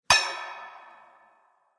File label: Treated Metal Hit; Metal Sound Effects; Impact